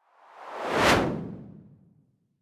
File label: whoosh simple hard incoming high